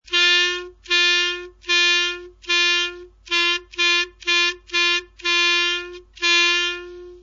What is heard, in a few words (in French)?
debutant, clarinet